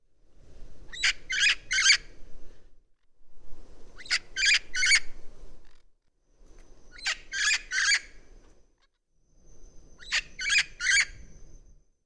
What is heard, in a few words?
Call Seqs; Lil Crn Parr 4